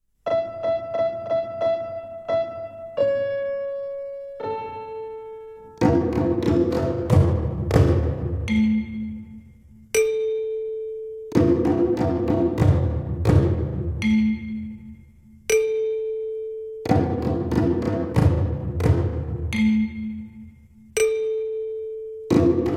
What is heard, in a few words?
The First Twinkle Rhythm